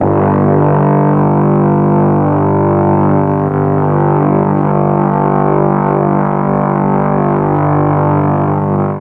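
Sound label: G2 half speed brass, mellotron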